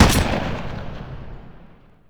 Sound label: m2 mg fire; WAR